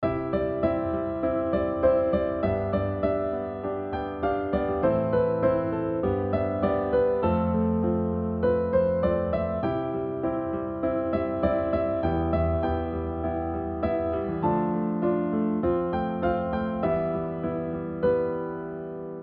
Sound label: chords + melody